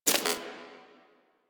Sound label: Screen Glitch; Warframe; Mini Games; Caliber Chicks Minigame